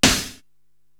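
snare drum Thick Gate, Drum Machines, Korg TR-Rack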